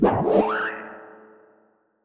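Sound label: deep house FX, 125 bpm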